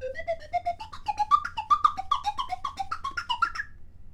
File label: Random Stabs, Slide Whistle